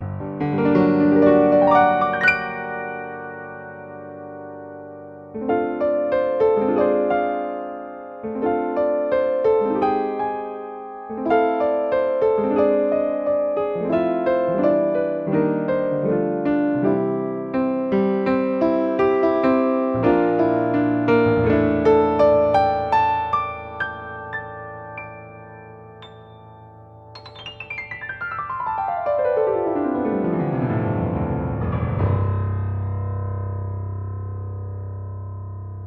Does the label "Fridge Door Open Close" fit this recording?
No